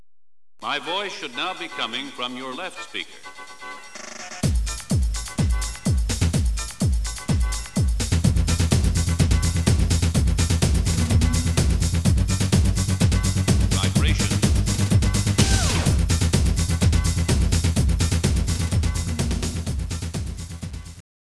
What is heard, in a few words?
house